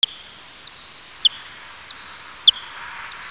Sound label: barb, bats